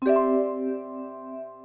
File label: enter